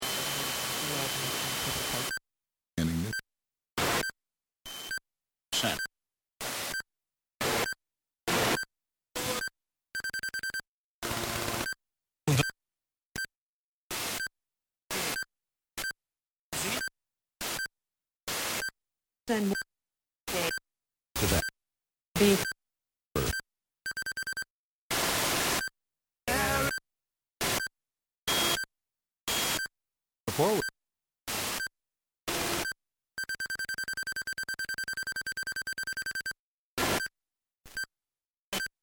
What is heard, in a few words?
Sony Walkman Tuning, Jumping between radio stations, short bursts of radio; short bursts of radio transmissions with noise and beep; Noise Sound Effects; LynneMusic